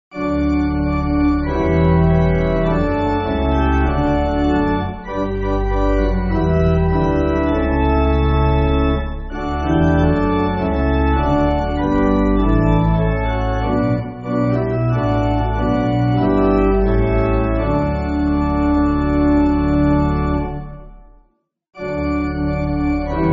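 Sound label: Pipe Organ, church backing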